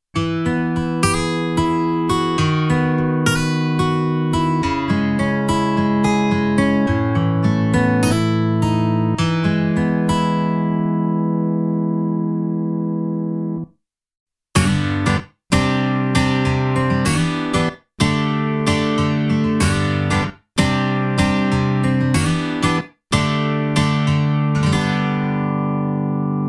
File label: Live Worship Acoustic Guitars